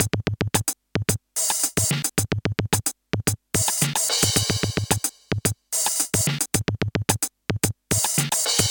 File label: psr 37 beat; Beat Orginal